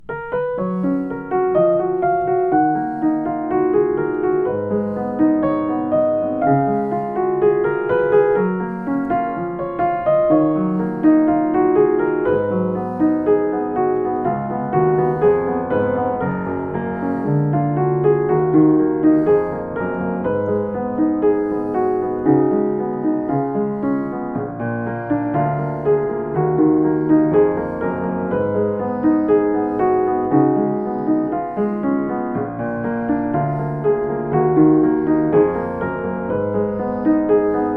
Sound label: Yamaha SU118, piano